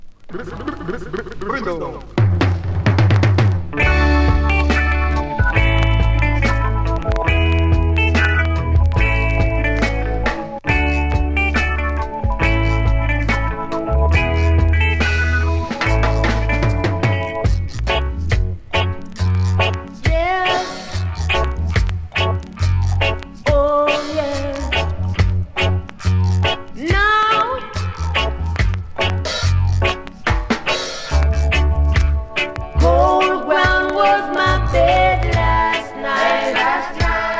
talking blues

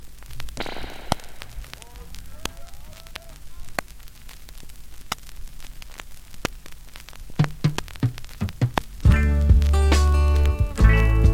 talking blues

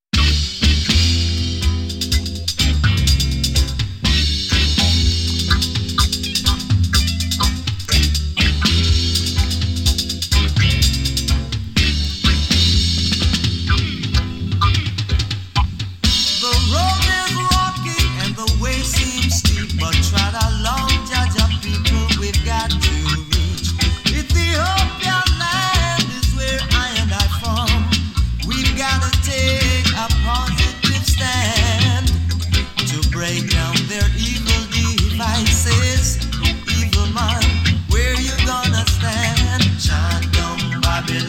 chant down it

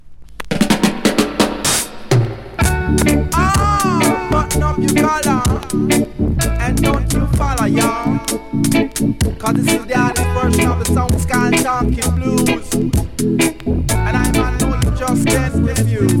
rocking blues

